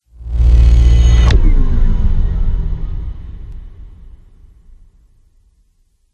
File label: spaceship fly by; george gazetis